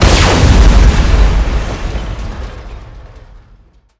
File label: heavy laser; weapon